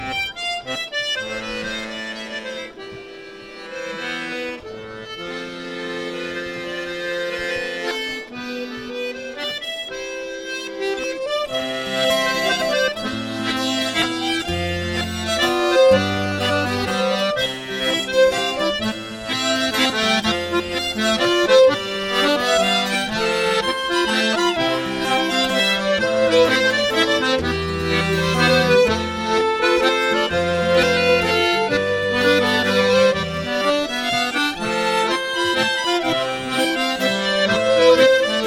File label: mazurka